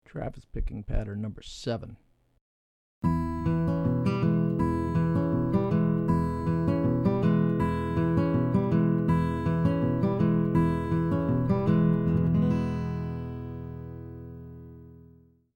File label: Travis Patterns; travis style lesson materials